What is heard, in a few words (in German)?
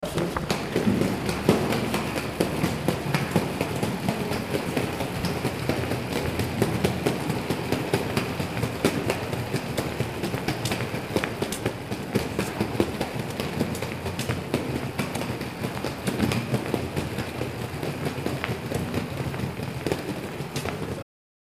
Rollkoffer